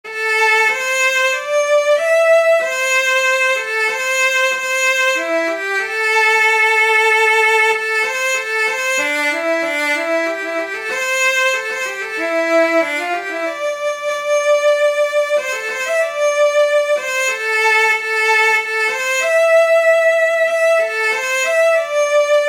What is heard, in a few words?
gamelan